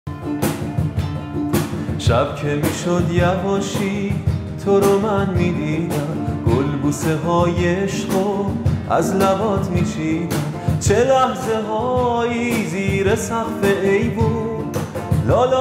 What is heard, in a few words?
With Guitar